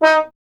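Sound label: bass trombone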